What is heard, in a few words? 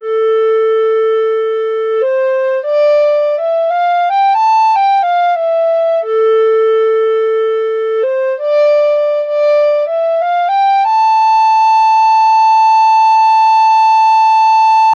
air melody a minor